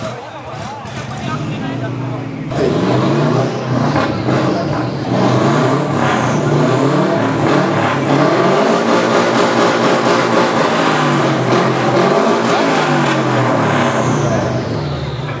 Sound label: retro festival